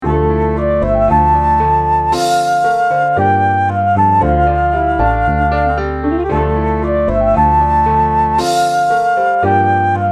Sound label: exotic classical